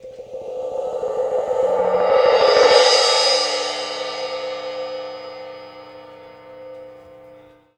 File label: Push Cym; Sonic Foundry (Sony Creative Software); World Pop; Percussion; Cymbals